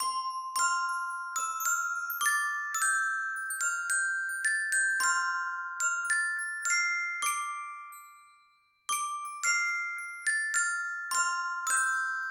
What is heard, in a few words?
Auld Lang Syne; Google; Pixel-4; Seasonal Celebrations